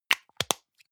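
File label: deodorant can lid close hard, badezimmer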